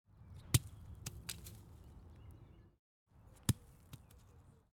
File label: Fir Cone Falling From A Tree